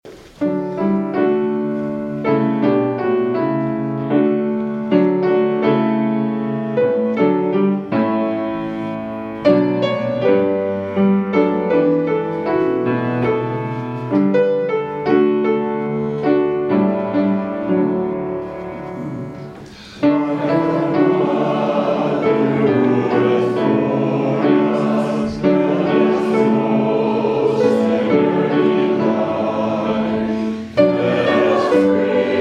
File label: Hymn; Like a Mother Who Has Borne Us